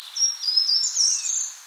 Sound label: Short-toed Treecreeper, Gartenbaumlaeufer